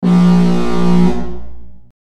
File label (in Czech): "lo houk", Dopravní prostředky